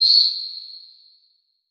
Title Transition Feedback High Pitched; Production Element

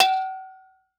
Cartoon Frying Pan Cartoon Pan Hit; AdobeCC

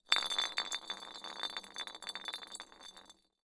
Glass Spin Beer Bottle; Foley

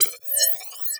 Multimedia Internet CD-Rom Flash Hi-Tech

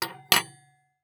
Fork Set On Wood Table Setting Table; household

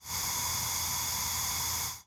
Industry Blow Torch Gas Release

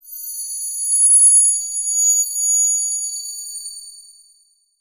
Title Transition Digital; Adobe; Production Element

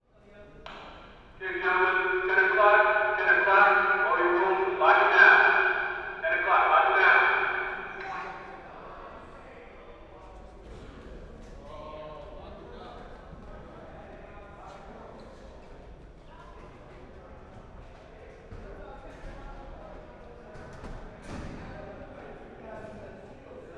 Ambience Lockdown; AdobeCC

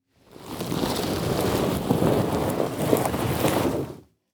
Impact Body Drag Through Dirt And Rocks Medium; Impacts

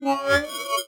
Sci-Fi Electronic Computer Power On; Science Fiction

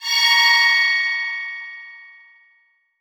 Title Transition Feedback Blast; Production Element

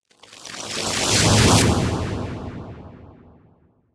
Transition Whoosh Warble

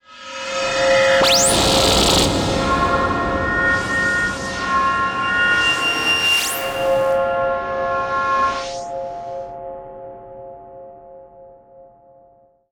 Electronic Whoosh Digital Resonant Cymbal Wash; Module Production Element Title Imaging

